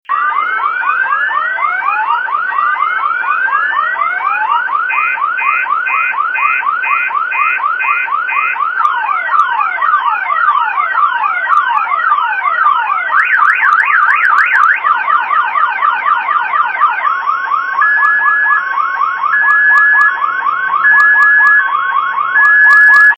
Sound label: car alarm; garrysmod